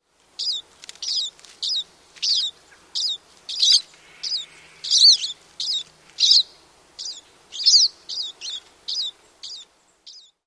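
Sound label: calls, pine siskin; WY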